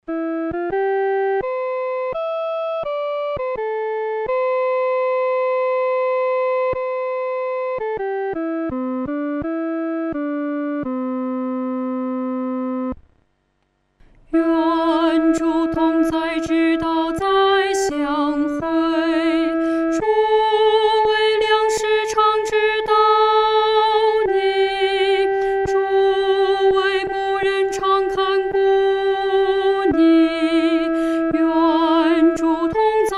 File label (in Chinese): 独唱（第一声）